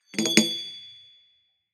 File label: Bumble; Google; Google Sounds